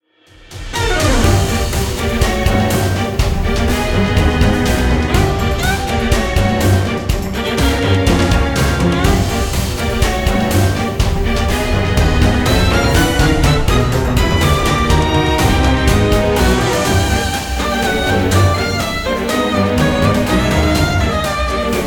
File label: Violent Strings; Fire Emblem Heroes; FEH Map 09